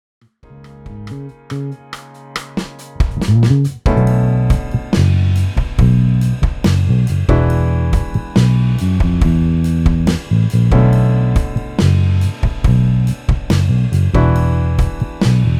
playback B

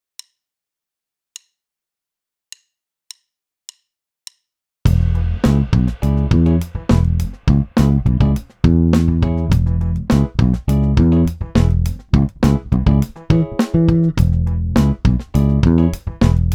tones and bass

